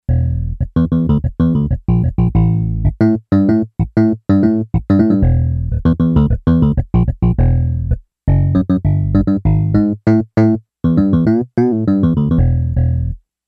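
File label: Yamaha DX11 Electric Bass; Factory Preset Voices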